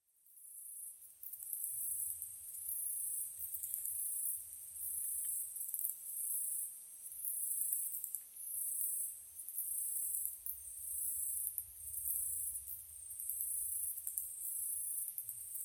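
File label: katydid; yamayabukiri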